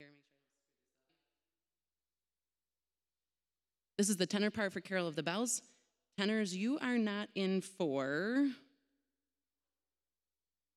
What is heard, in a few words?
tenor carol of the bells; christmas eve